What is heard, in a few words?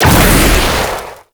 Spike Orb Fire Impact; Warframe; Tau; Circles Of Hell